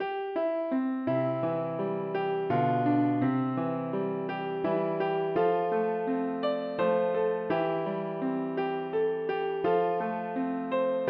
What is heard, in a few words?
piano